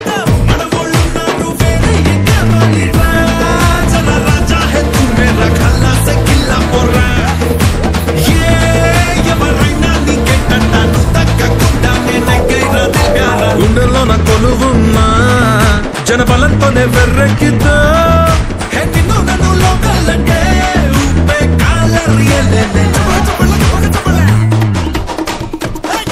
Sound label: Whistle Telugu Ringtone; Telugu Movie